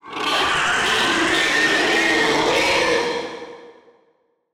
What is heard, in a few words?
cardboard kaiju terrifying screech